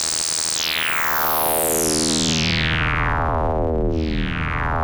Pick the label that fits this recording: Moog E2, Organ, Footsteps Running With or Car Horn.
Moog E2